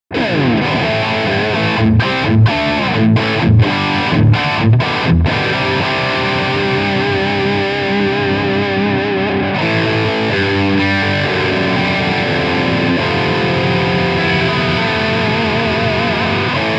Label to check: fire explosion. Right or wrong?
Wrong